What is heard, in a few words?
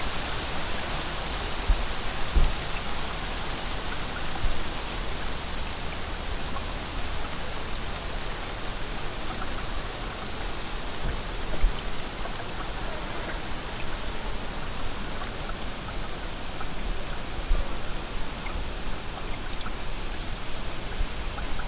Windy Meadow Nature Ambience Looping